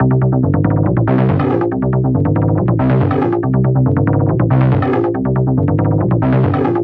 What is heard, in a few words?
140BPM C; Vengeance - Essential Clubsounds Vol.4